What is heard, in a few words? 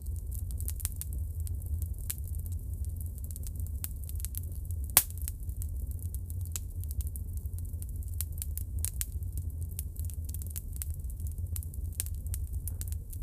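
strong and soft fire flames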